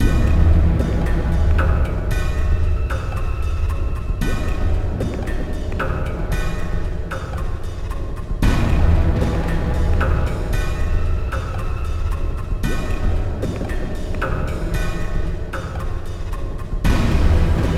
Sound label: Mount Dimon (Ambient); Eternal Legacy; Android